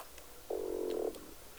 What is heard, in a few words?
Aplodinotus grunniens, soniferous; Champlain Canal C7; Fort Edwards NY 16jul2010